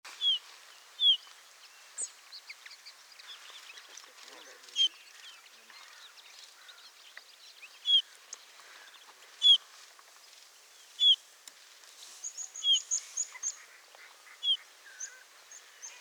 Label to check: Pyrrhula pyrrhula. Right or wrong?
Right